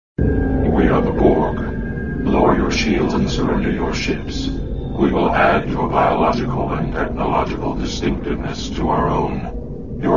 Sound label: huawei honor 9x ringtone